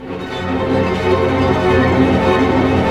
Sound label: STR ARP, Symphony Orchestra, STR Arp. Diminish; Roland LCDP08 Symphony Orchestra; STR Arpeggios